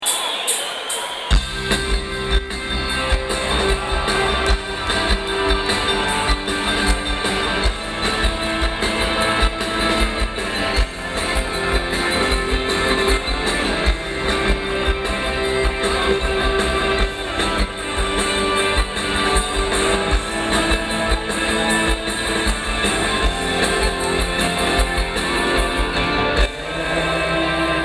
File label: Ring The Bells